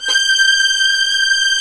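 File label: strings, Vlns 8 fff slo; Roland L-CD702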